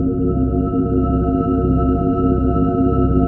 PAD Atmo; Best Service Gigapack 1

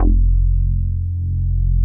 JX MOOG F2, MOOGY BS; Drums In Your FACE!

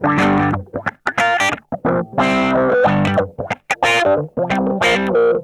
MAD DOG, Funk Guitar; 90 s Sample CDs; Zero G

